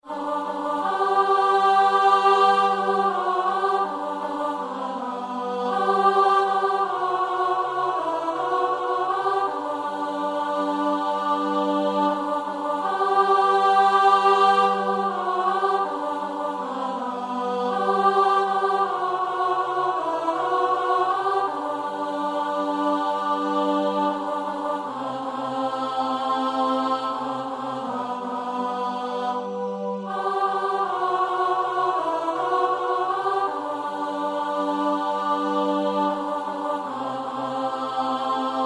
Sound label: Siyahamba ALT